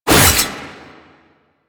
Sickle Whoosh; Lasrian Butcher Sickle; Lasrian Soldiers; Weapons

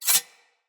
Sword Slice Accent; Weapons; Garren Sword

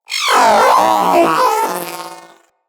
Companion Vocal Death, Pets; Zanuka

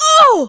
Pain, Barks; Kuva Lich; Persona B

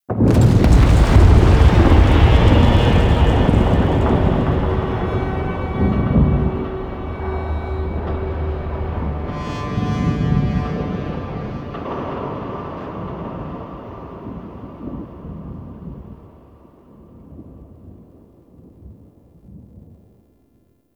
Large Arms Move Start; Ambience; Gameplay; Warframe; Duviri

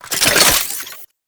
Wrist Gun Reload; Warframe; Tenno; Incarnon

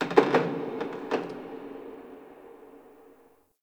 Wooden Creak Small; Warframe; Ambience; Nineteen Ninety Nine

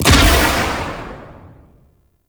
Artillery Barrage Explosion; Archwing

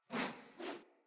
Great Sword Whoosh Accent Air; Weapons; Player; Swords; Mendicant Great Sword

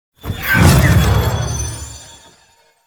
Treasure Ability Cast; Warframe; Warframes; Helminth Abilities

